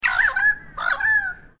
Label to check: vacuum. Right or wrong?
Wrong